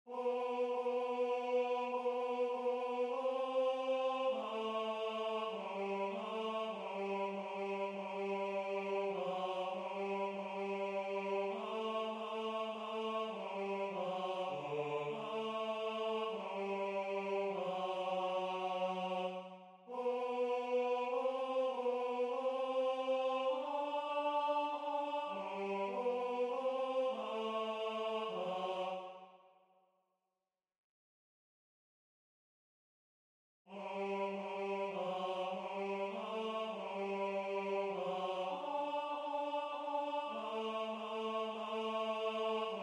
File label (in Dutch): Tenor; Adeste